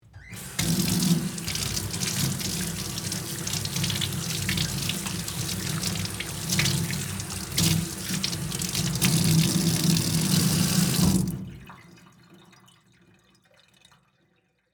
Utility Sink Wash, Industry, Utility, Sink, Wash, Hands, On, Run, Off, Faucet, Metal, Basin; Bathroom Sound Effects; BlastWave